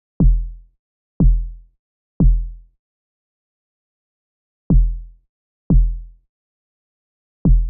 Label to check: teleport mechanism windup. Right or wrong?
Wrong